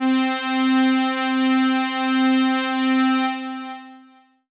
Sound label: Synth C, Synth Prophet One Shots; Electronisounds